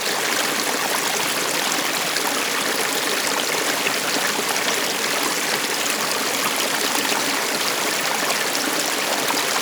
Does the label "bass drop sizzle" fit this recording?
No